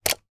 household cell phone charger mains plug put in wall socket